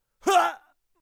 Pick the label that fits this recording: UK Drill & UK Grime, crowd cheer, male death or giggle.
male death